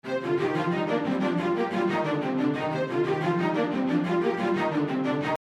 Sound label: dark strings